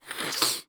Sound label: Human Male Sniff Drug Sniff